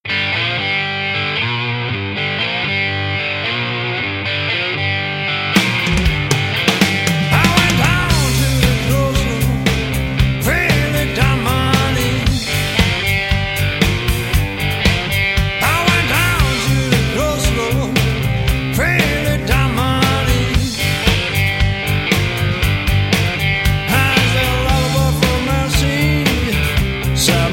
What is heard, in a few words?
Blues